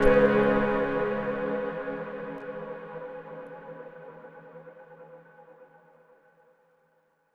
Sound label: Overlay Dark